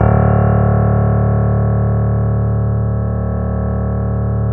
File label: BOSENDORF